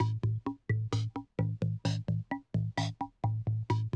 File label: 130, Classic DrumLoops